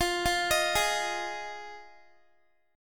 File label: F Minor 7th, down Guitar; Fm7; arpeggiated